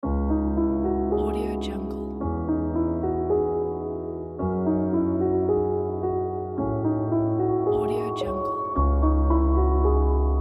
dark tragic intense piano logo

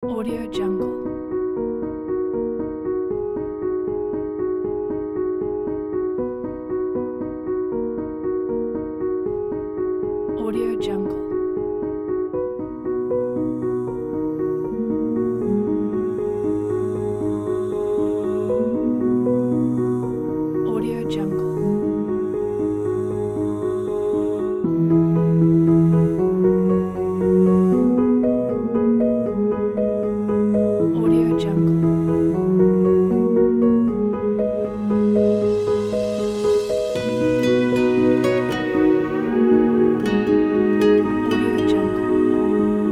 romantic inspiring piano

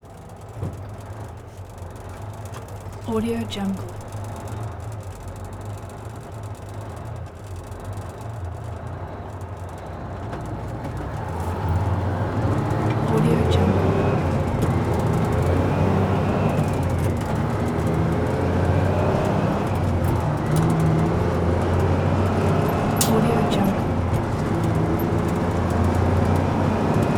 semi truck detroit diesel interior cab

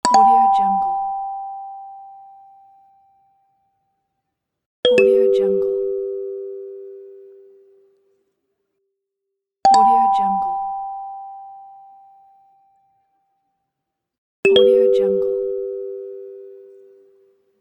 soft doorbells

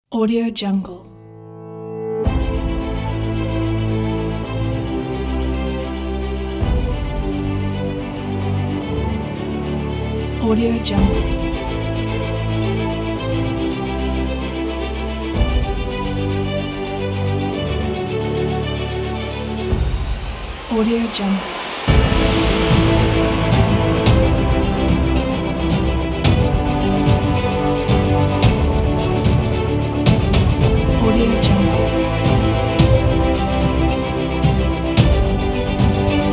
epic cinematic

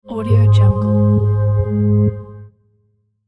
dreamy pulsing notification accent; sound ideas

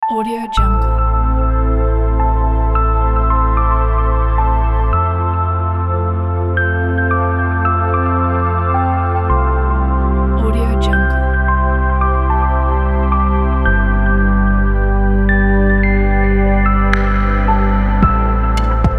abstract minimal intro; newlifemusic

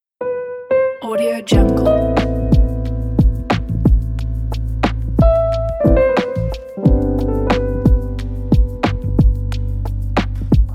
lofi chill jazzy piano hiphop loopable

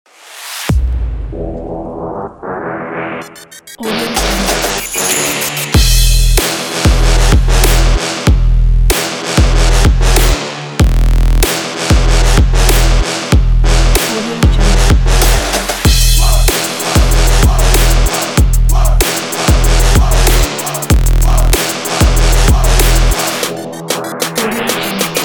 trap

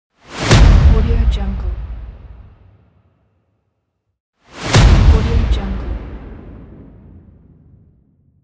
an impact

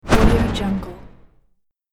magic maker

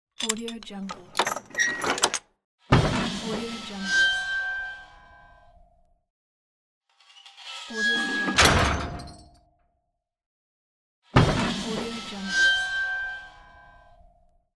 grating metallic door

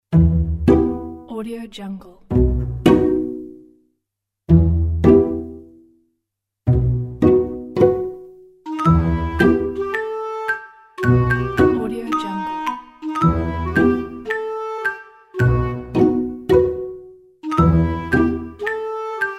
awkward; commercialmusic

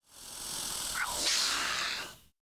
creature vocal spider sucking hiss

